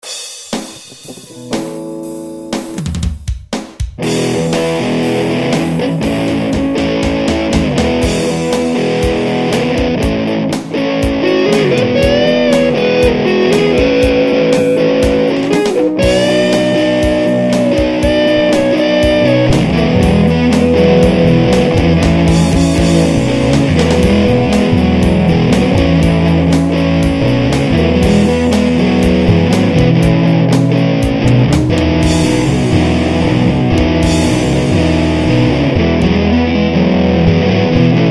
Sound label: Rough Rock